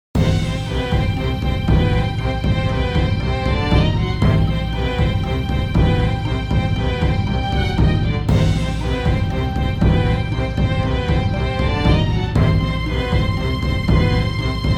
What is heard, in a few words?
Indian Melodramatic; theatre films music pack vol 01